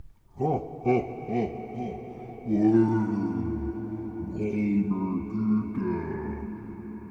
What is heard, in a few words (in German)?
echo grusel; Geräusche